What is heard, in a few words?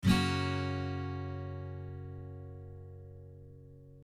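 G♭, guitar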